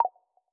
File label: Video Stop, ui; Essential PH-1